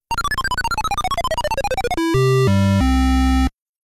Lose a Life Theme; DK NES

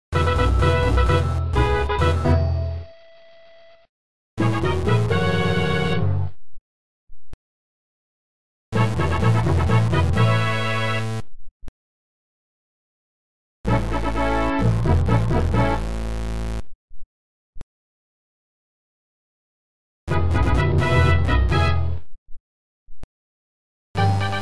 Fanfares; Yoshi Topsy-Turvy

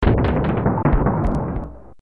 Secret Door; DKC2

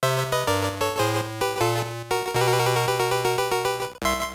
Unused Game Over Variant

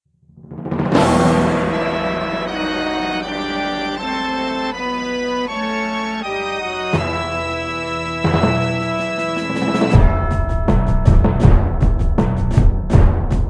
Final Battle; NSMBW

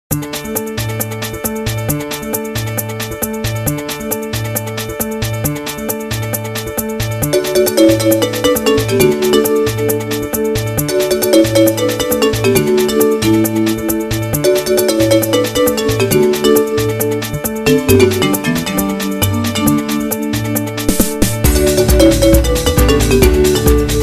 Toad Road; Mario Party 9

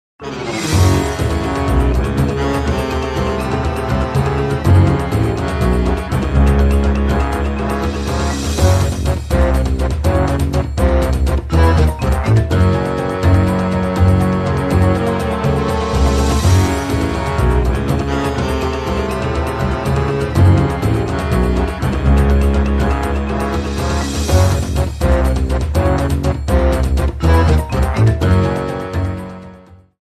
Bowser Appears

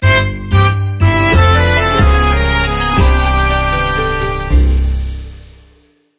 Game Over; SPM

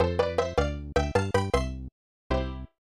Course Clear; SMAS; SMB3

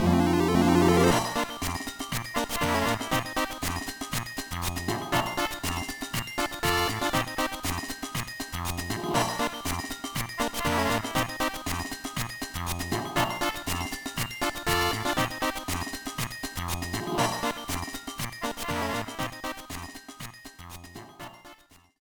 Mini-Game Attack Attack 5 Completed

